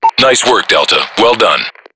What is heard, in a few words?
win; payday